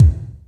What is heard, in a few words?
POWER KICK, ACOUST KICKS; Greytsounds